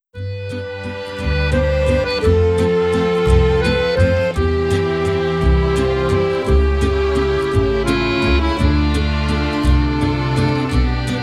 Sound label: instrumental